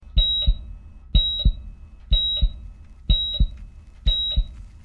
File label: Pulse